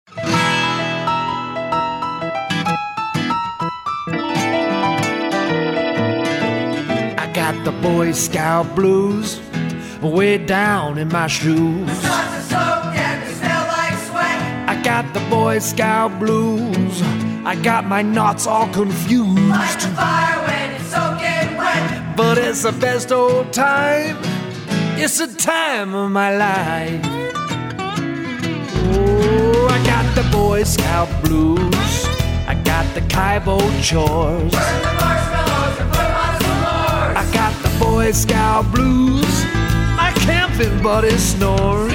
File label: Boy Scout Blues